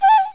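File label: bird call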